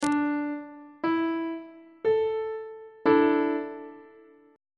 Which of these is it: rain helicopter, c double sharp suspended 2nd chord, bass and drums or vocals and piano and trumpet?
c double sharp suspended 2nd chord